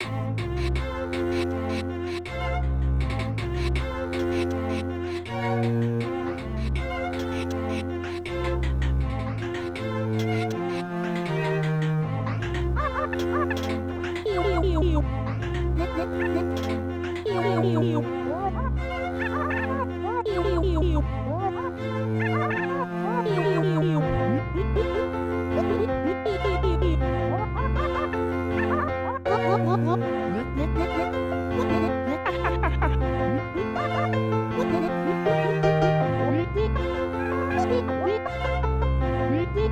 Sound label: music competition